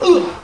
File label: hum; INC demo